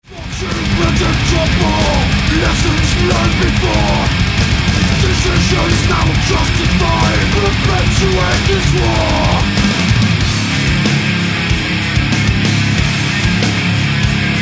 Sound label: death metal